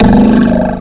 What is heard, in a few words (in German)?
Wippe; Jump Zampoli